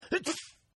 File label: Sneeze; Sneezing; Human; Partners In Rhyme